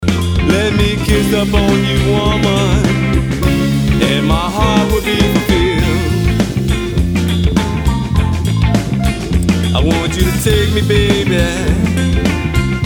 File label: Danceable Blues